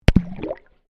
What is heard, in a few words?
Water Microphone Dunk Shallow Submerged; Submerged splash sounds; Splashes; Sound Effects:Objects